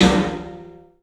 PA DEEP L, CLUB SN VRB; 3D Sound; 3D Drums

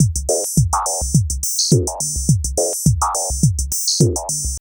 SYSTEM 100; AMG - Vince Clarke Lucky Bastard

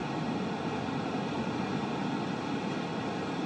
Ambience Loop, outdoor

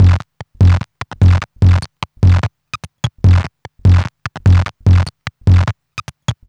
Modular Loop, Modular Percussion; Sonic Foundry (Sony Creative Software); Synchro Funk

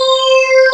WAV SWEEP C5; Big Fish Audio; Synth City

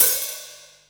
HAT, Techno 138 BPM; Best Service ProSamples vol.54; AKAI

